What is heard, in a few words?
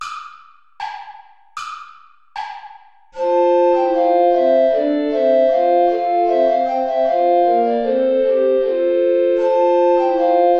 play along without soprano recorder; traditional